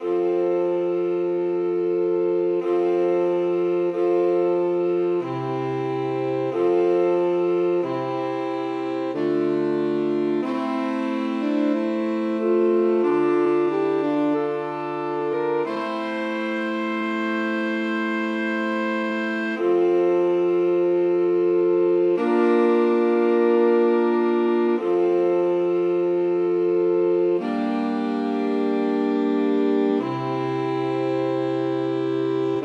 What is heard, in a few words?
O Salutaris Hostia Sax4; La Rue